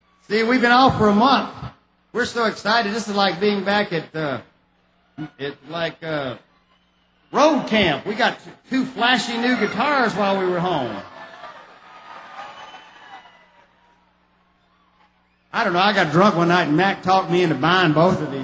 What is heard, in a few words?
New Guitars